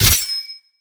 shining sword

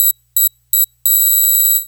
grenade cast

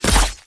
multi sword

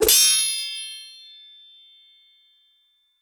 sword tsing

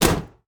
flap radio

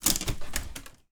open box

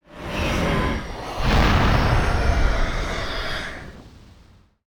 chimera

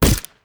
rifle

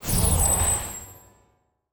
magic

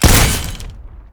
staple gun

